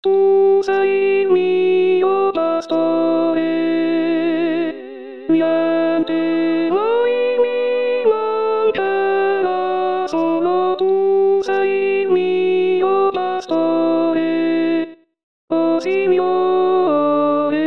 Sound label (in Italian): Contralti; anno liturgico